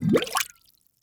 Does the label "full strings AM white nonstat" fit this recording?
No